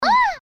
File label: jump; Rin black